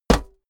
step walk metal solid

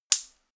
gas stove hob ignite single; gastronomie; berufe

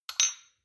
shot glass clink double; soundpool

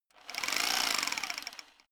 rock drill chisel short fast; maschinen; schrauben drehen hydraulik

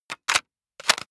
sci-fi cock plastic; comedy-cartoon; pistolen-gewehre

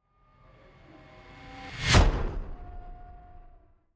fly by hover car hum high

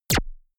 gun shot silencer synthesized zap; schusswaffen

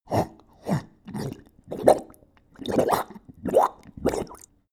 dog drink bubbling; natur-tiere; hunde

